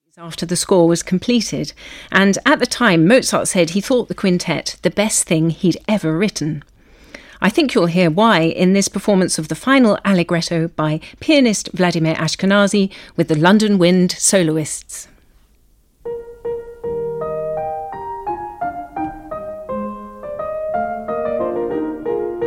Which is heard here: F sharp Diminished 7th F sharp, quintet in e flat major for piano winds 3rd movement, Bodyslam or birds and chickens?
quintet in e flat major for piano winds 3rd movement